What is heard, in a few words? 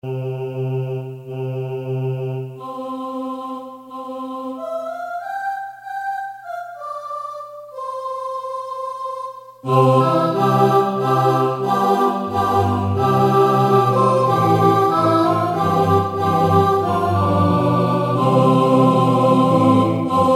SOPRANO